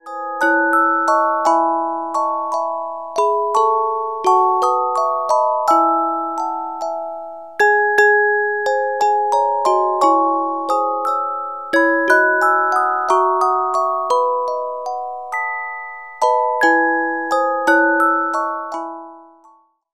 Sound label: Carillon Music Box Melodies